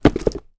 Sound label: shoes work boots x2 drop down on ground; foley; zapsplat